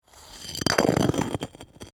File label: Ice Object Drag, Across, Ice; Texture; Dragging (Sound Effects:Objects)